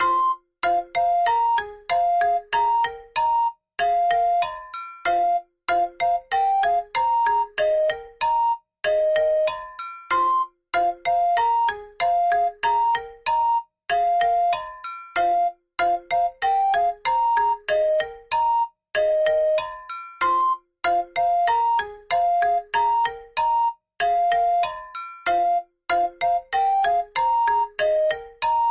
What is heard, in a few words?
gameover